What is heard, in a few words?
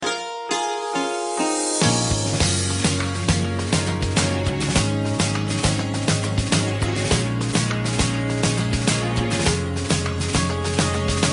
Loop Instrumental